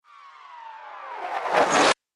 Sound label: Slow Vacuum Whosh Stops Abruptly; Whoosh Sound Effects